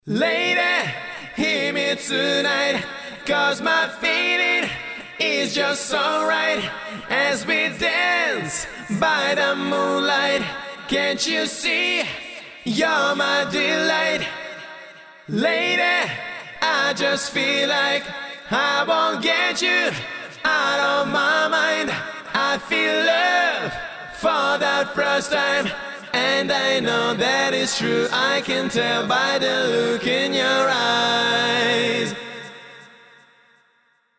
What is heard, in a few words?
Acapella